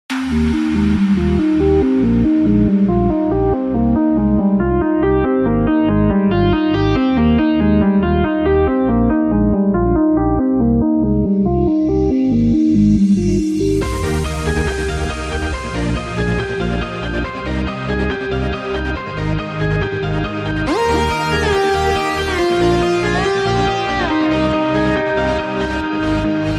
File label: Melodic EDM; Geometry Dash